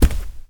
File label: Rug Walk